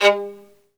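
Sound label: solo violin, strings; ILIO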